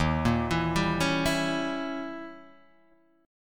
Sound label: Eb 7th Flat 9th Eb, Guitar Half Step Down